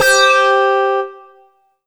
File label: Alesis S4 Plus 5ths Lead C5